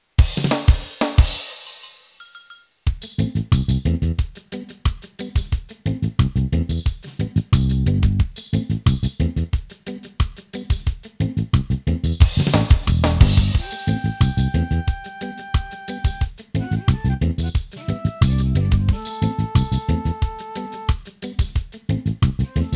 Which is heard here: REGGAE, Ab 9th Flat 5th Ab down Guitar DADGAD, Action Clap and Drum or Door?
REGGAE